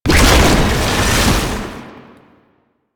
Proto Infested Passive Cast; Warframe; Babau; Abilities

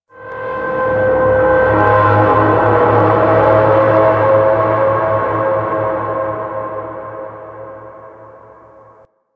Tonal One Off; Hub; Steel Meridian; Warframe; Tenno

